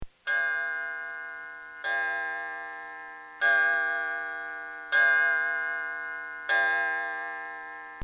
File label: Clock Chimming; Clocks; Machines; Bobby Cole; recorded in a custom built sound booth